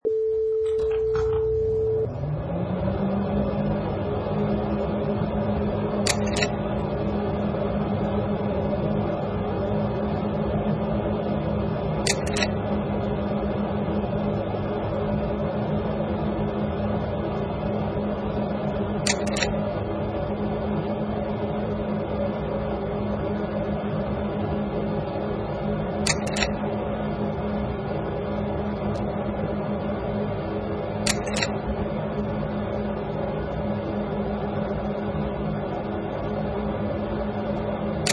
Slide Projector with Clicks

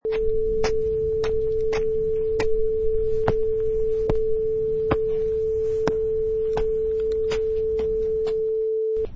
Footsteps on Concrete Steps

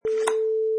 Cell Phone Open

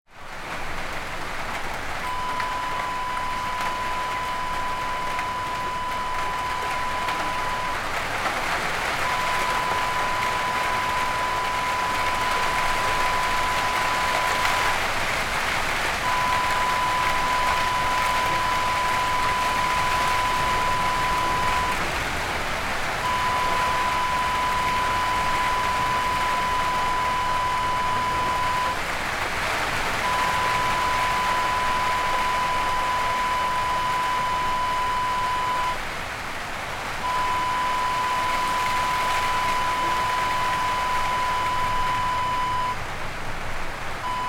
rain on window

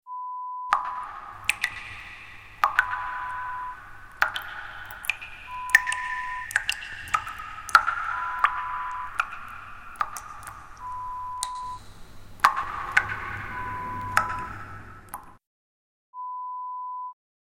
Dripping sound

